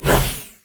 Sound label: ranged attack; LoL; jayce